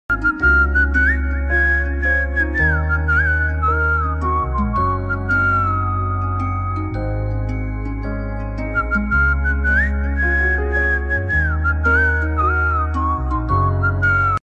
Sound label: ringtone flute ringtone calltune call rings music flute